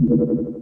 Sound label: Space Recycle